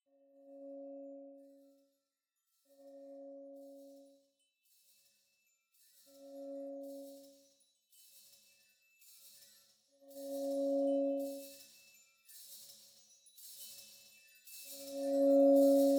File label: Footsteps; Fairweather s Footsteps; Kynseed